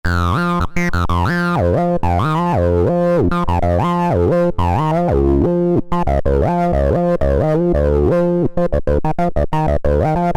Bass, Korg MS2000; Factory Patches

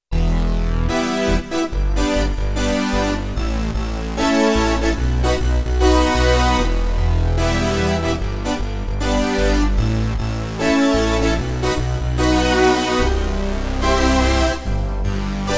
Mondo Poly, Roland D-70; Factory Performances

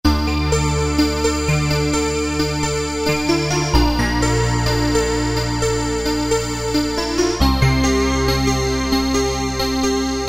Piano FX, Roland Alpha Juno-2; Factory B

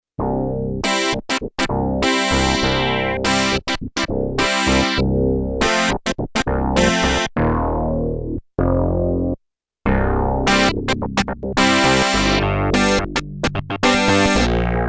TOUCH SYNTH, Korg DW-8000; Factory Patches

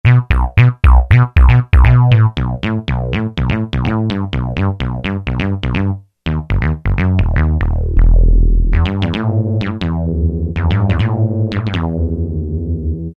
Filter Wow, Alesis QS8; Factory Preset examples

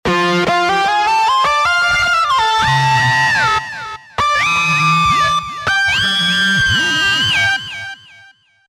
Upset Solo, Roland V-Synth; Factory Patches

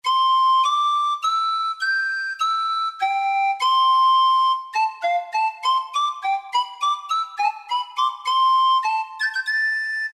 Recorder; Roland PN-D50-03

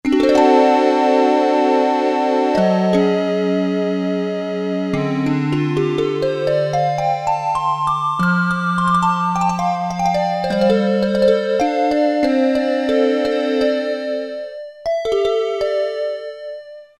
Vibraphone, Casio MT-600; Tones

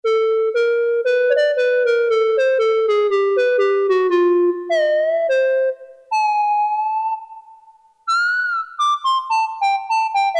CLARINET, Roland Jupiter-8; Factory patches

